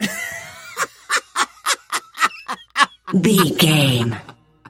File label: voice male laugh high long